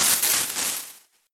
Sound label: damage electric